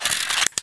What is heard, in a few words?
Rifle Internal Reload, Sniper Rifle